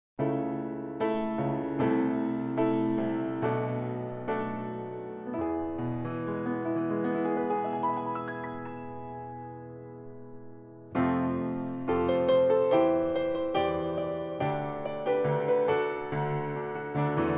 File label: Piano